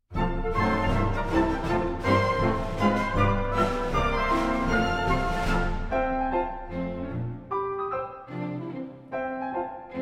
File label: Piano